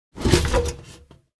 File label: Metal trash can lid opening; household